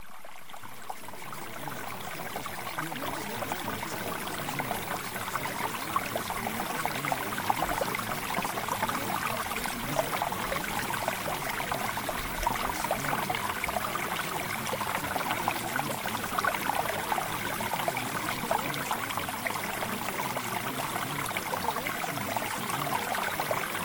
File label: Mountain Stream; HIGH VALUE